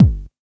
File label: BD, Dance Mega Drums, BD HOU; Best Service